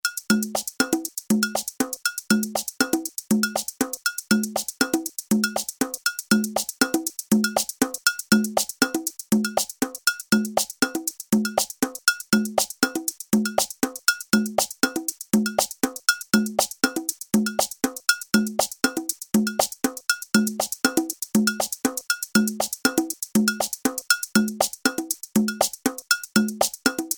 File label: Accent